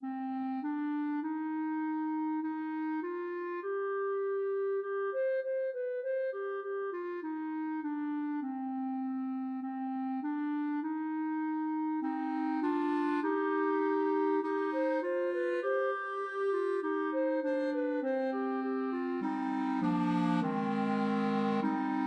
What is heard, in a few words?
Clarinet Trio